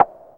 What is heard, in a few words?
NOISE BLOCK, synth perc; Ambient; Zero-G; Ambient 1